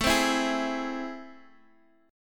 A Minor Major 7th A, chord

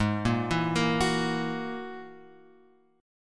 G sharp 7th Suspended 2nd Sharp 5th; G#7sus2#5 Chord {4 1 2 3 X 2} arpeggiated

